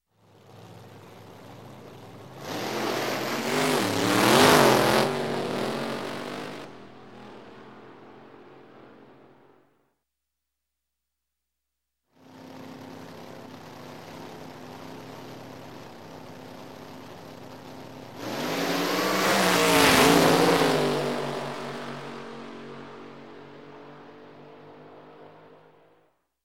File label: Dragster Race By x 3; Sound Ideas; Series 2000